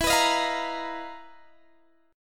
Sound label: B Major 7th Flat 5th E, chord shape; BM7b5/E; strummed; JGuitar